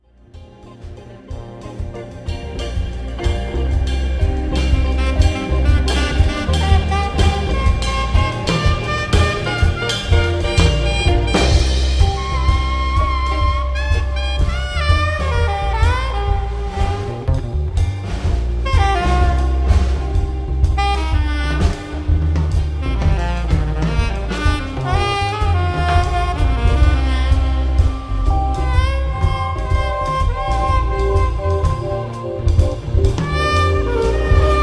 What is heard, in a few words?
Pop Reggae